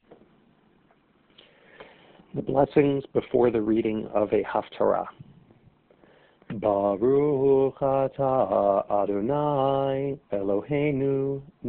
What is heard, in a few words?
blessings before haftarah, shabbat tunes